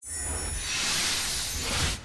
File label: hunted plus presented; turn your fortune